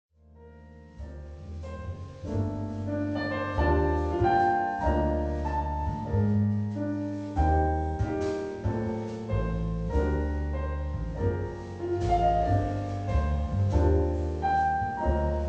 Jazz wet; Savant Audio QRS; Quantec QRS

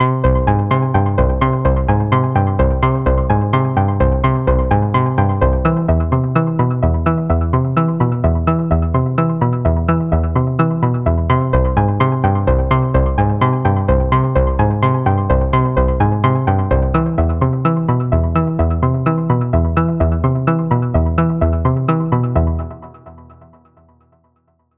Sequencer; Synapse Audio The Legend HZ Black

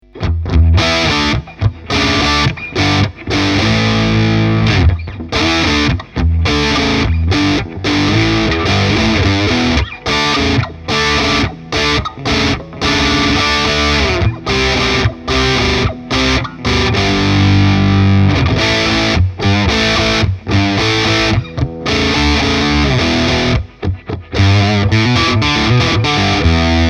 Tone Master FR 10 Heavy Rock; Fender

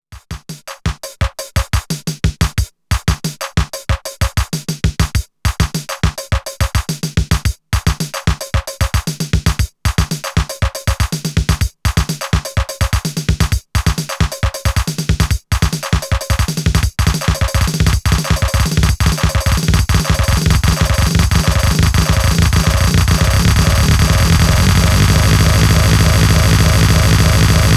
707 Drums and Percussion Drone

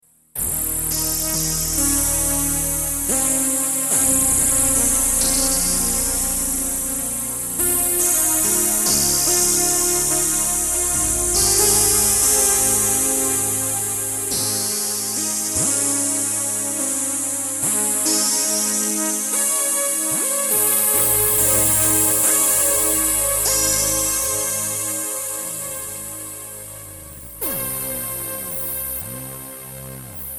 Dual String; Super Gemini

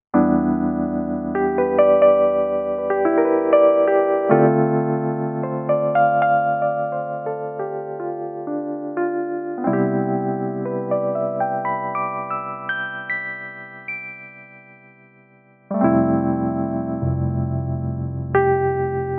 Casio PX-S5000 E-Piano

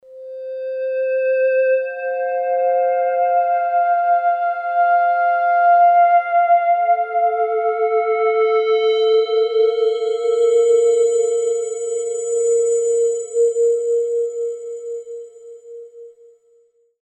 Hydrasynth clean fm

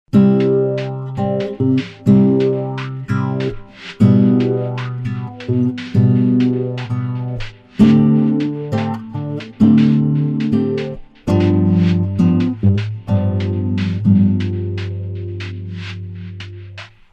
Melted Percussions; EFX Motions

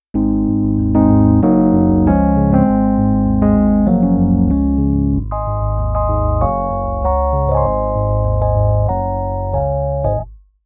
Nord EP1 Deep Timbre

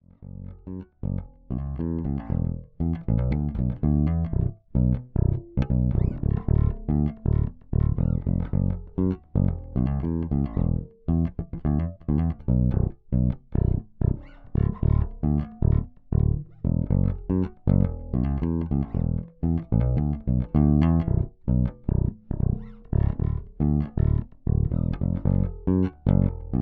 Radial J48 K Bass

